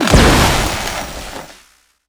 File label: damage hits explosions, Warships